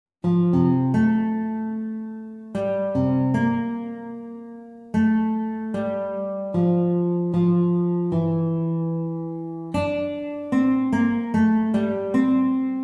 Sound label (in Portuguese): Violao Dona Nobis Pacem